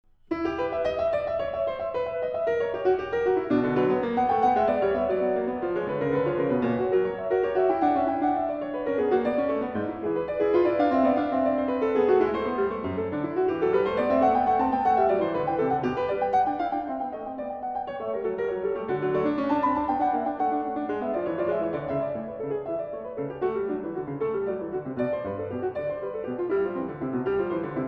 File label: Fugue in E minor; BWV 855; The Well-Tempered Clavier, Book 1; Angela Hewitt